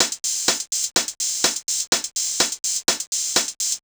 drum clip hat 125, Classic Chicago House Drum Loops